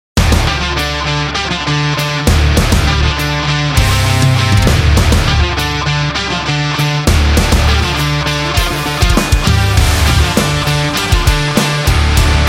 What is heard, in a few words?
Action Upbeat Sport Rock